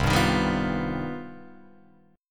C Minor Major 11th, Guitar, Open F; CmM11 strummed; JGuitar

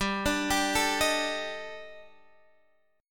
Eb Major 7th Flat 5th

